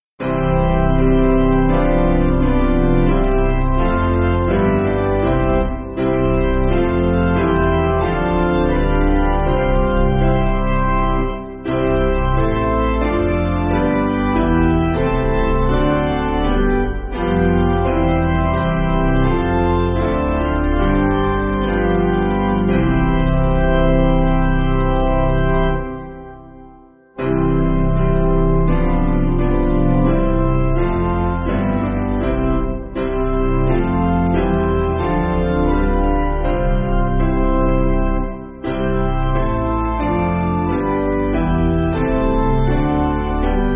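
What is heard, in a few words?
Piano, Music Backing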